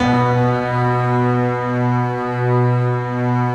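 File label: piano strings, B2; Best Service; Advanced Media Trax Modern Composer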